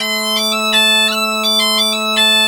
AM BP, Celtic Flavours, BAGPIP085; USB Soundscan vol.42; AKAI

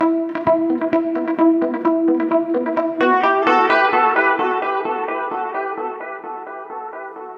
E Gtr Muted; Zero-G; Future Wave

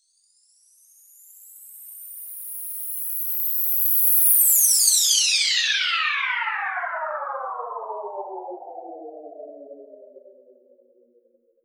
Breakdown FX, Underground UK House & Electro

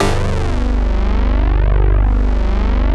OBESE 5TH G1, SUPER JX; Northstar - New Gold VOL-1

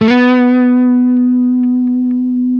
LES PAUL LD, Guitars; Spectrasonics; Hans Zimmer Guitars VOL-1

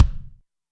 kick, Zoom MRT-3B; Kit8

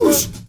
MALE WAR, Heart of Africa, shout

